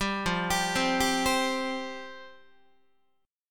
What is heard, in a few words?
F Suspended 2nd F Guitar; Fsus2 arpeggiated; JGuitar